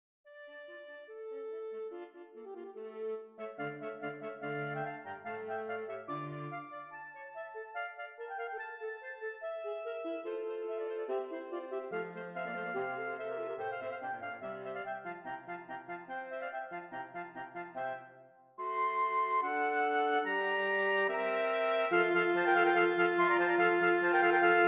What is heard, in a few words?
String Quartet in D minor; String Quartet 35; IV. Finale. presto